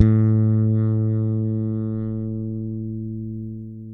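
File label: fret buzz A, Pedulla bass; Masterbits CLIMAX 7 - Bass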